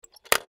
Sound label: Latch Object Device Lock; Latches; Foley